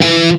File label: METAL SINGL; East West; Global Sounds